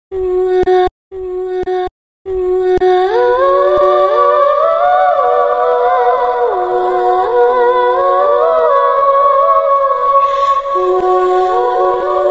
reverb slow

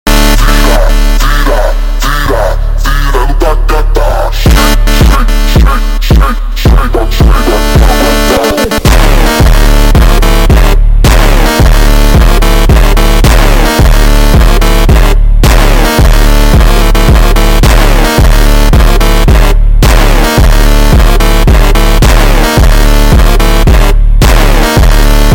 Funk super Slowed

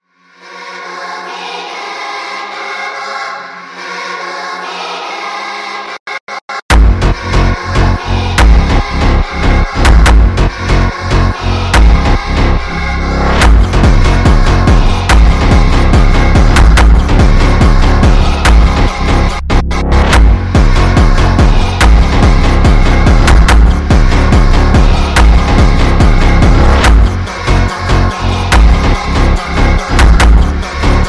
Bulgarian sped Up

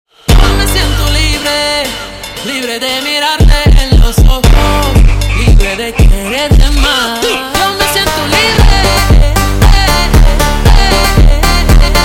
Funk slowed

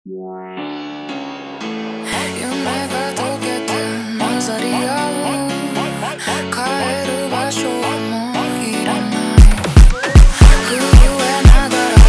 Montagem slowed

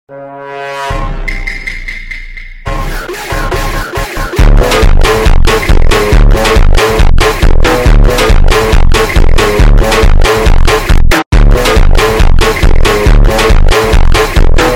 Funk sped Up

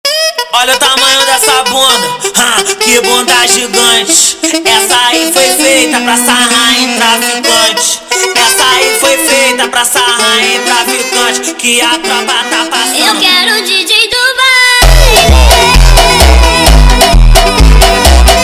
Funk

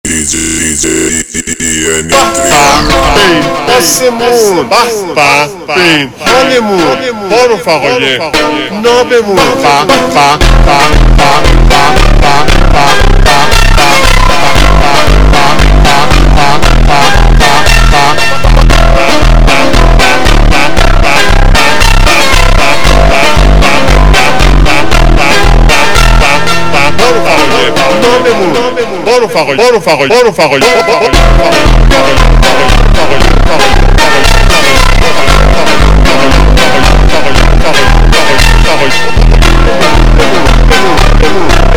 Funk slowed

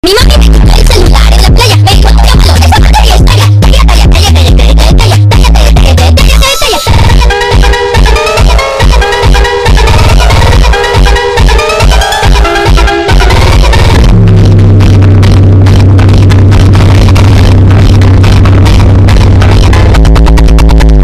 funk Superbass

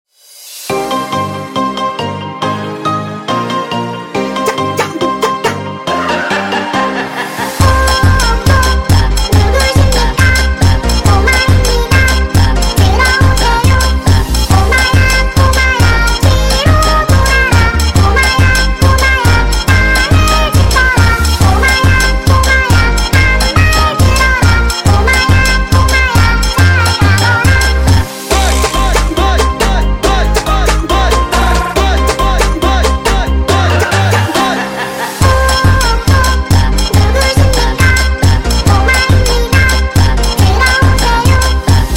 Funk Sped Up

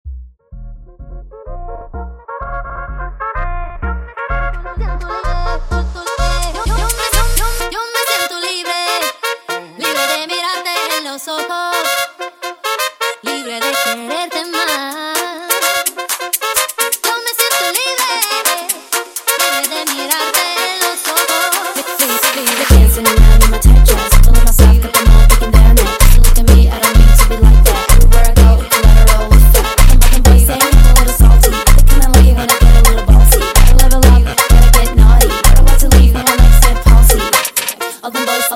Funk sped Up

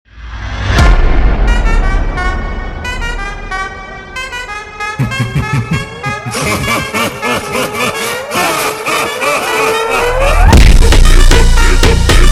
slowed

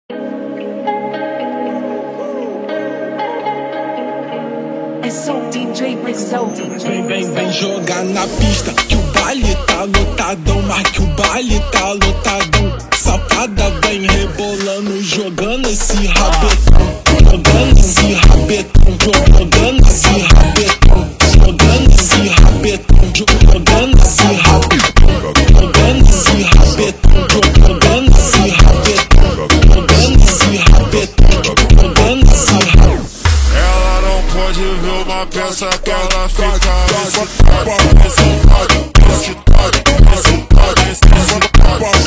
FUNK Slowed

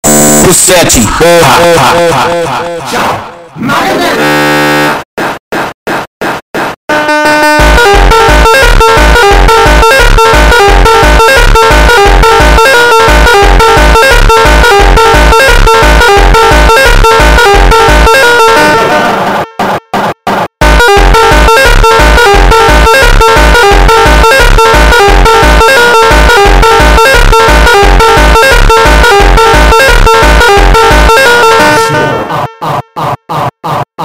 Funk, speedup

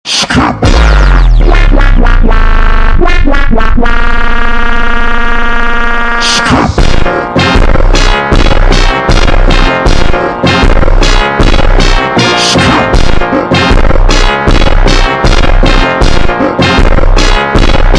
Funk ultra Slowed

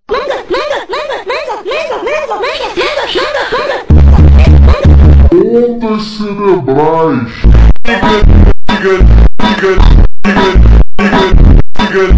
MONTAGEM FUNK Slowed Reverb superbass reverb slow

